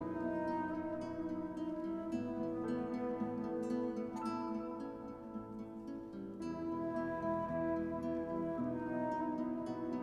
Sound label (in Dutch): instrumentaal